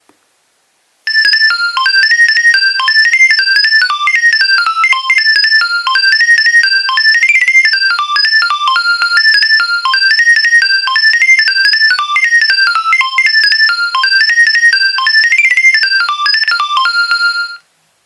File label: Nokia 2300 Ringtones